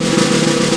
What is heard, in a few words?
Snare Roll, c4; BGM; Nintendo; July 2020 Gigaleak